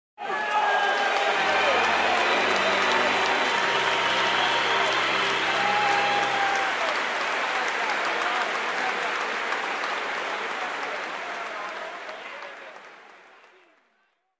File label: Herd Cheering and Applauding